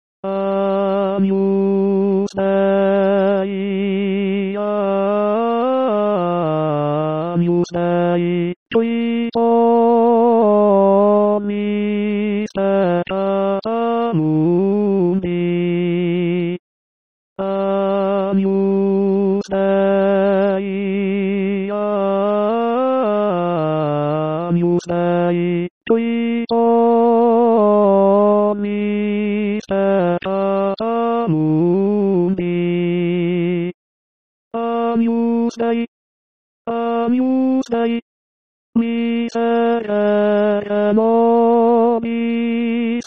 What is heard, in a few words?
Agnus TENORS; Missa pro pace